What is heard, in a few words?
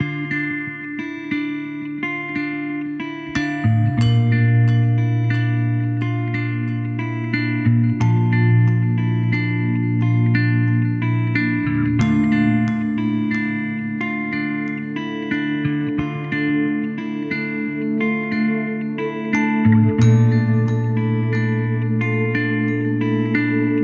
Burst Bubbles